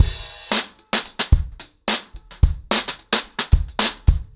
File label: 110BPM, ACOUSTIC DRUMS; The Roots Drumloops